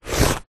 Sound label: office pen ballpoint draw line on tissue paper